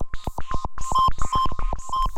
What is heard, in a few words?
NOISE; Pro-Rec - Synthsonic